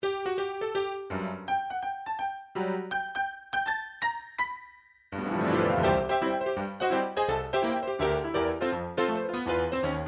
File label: rag tune